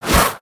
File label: Massive Spear Swing